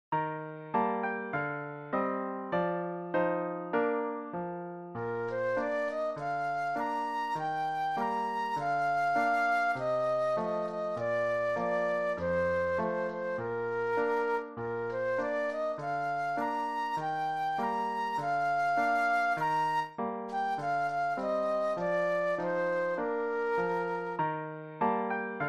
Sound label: Flute Solo